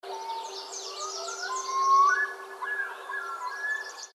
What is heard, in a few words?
LOON COMMON; species/birds; wbba